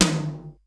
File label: DOOOO TOM XH, drum; Crypton - DrumBase5000